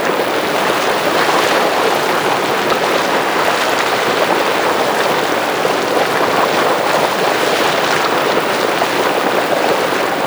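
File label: Water Pool Ambience Loop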